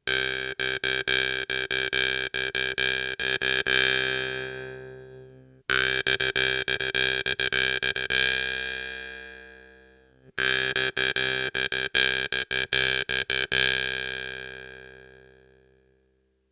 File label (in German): Zupfen Pluck